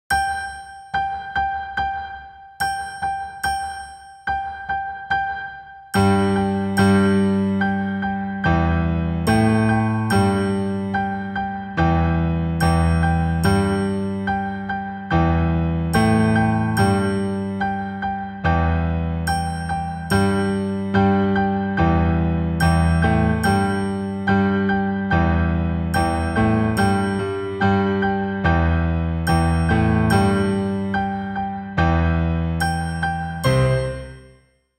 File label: Its Raining Slow Accompaniment